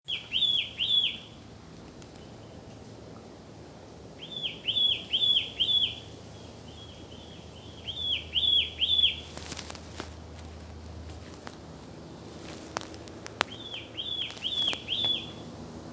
Myiagra Ruficollis Mimikae, bird species; Broad-Billed Flycatcher; Call 2

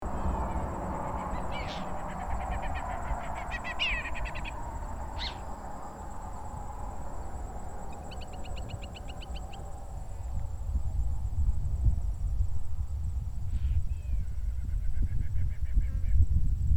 Circus Assimilis; Spotted Harrier; Call

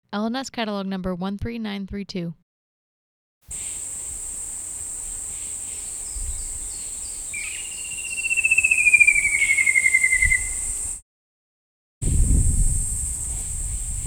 Myiagra Alecto Wardelli; Eastern Shining Flycatcher; Call; Call 2

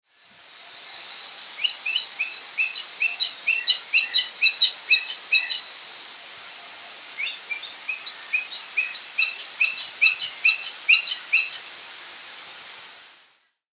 Aviceda Subcristata; Pacific Baza

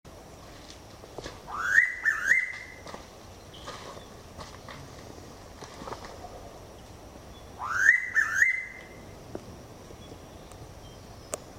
Pitta Versicolor; Noisy Pitta; Family - Pittidae (Pittas); Call

